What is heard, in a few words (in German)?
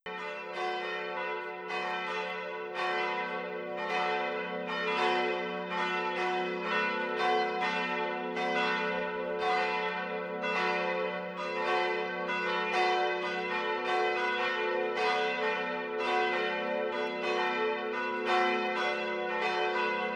Salvatorglocken; Kirchenfuehrung; Katholische Gemeinde Salvator in Lichtenrade